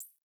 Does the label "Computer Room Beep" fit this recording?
Yes